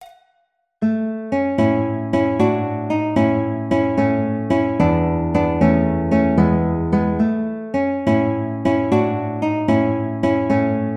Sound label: Poco Allegretto Guitar, classical